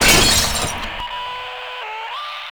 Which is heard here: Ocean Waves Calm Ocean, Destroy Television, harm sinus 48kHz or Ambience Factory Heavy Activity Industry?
Destroy Television